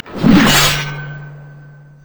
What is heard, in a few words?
quickdraw; LoL; yasuo base